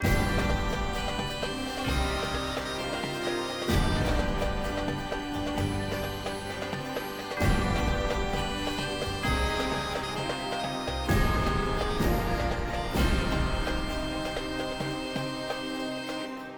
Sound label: ending; Fate The Cursed King